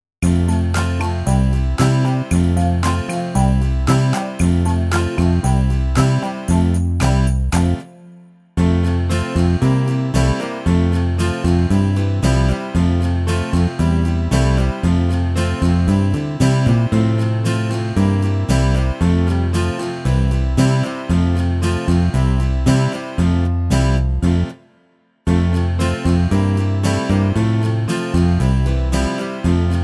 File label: Kanon Karaoke